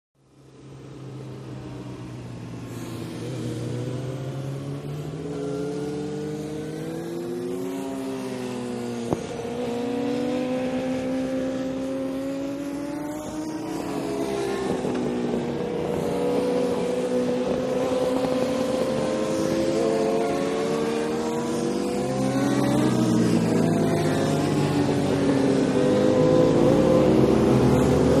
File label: Motorcycle Road Racing